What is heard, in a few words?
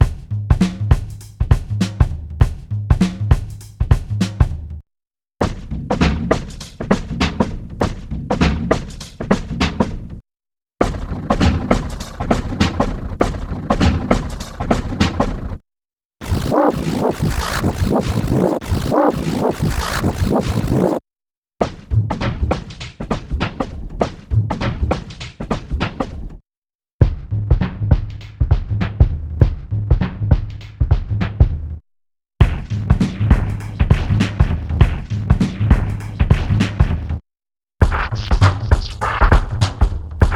Drum Loop